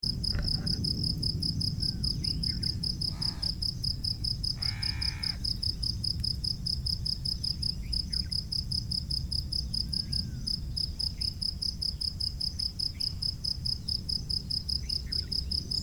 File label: crickets sound stereo at night; tanweraman